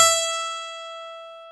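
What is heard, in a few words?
guitar E4; AKAI S-Series CD-ROM Sound Library VOL-8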